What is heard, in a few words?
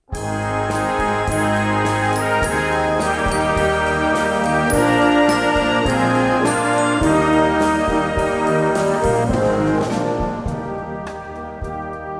Jingle Bells